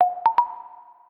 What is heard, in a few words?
text message; Librem 5